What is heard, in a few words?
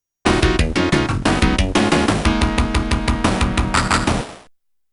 music game over